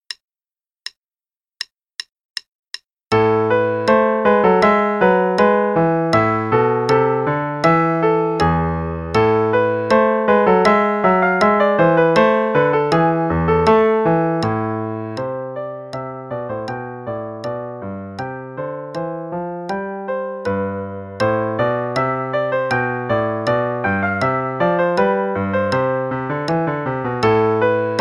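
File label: Play-a-long, Rigaudon, half note=80; Telemann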